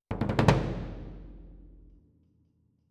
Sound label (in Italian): Cassa Close